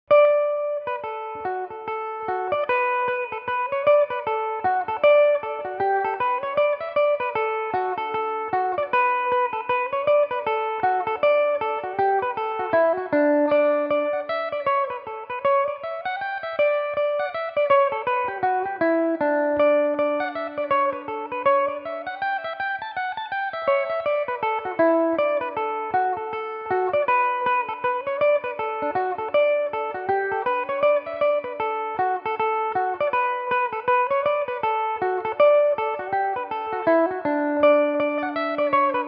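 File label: D major belmuse, irish mandolin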